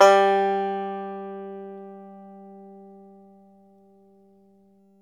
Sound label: ARCHTOP, Arch Top Banjo